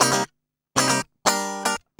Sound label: 120 BPM